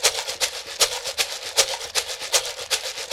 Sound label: TAP, African; African Contemporary; Spectrasonics; Supreme Beats